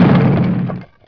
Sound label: Snowball Stop; Playable Tutorial Level; TR2